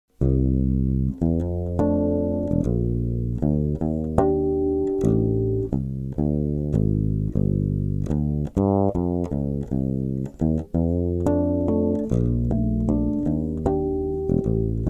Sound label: piezo; inspire pan